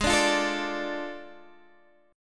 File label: D Minor Ab, chord; Dm/Ab strummed; JGuitar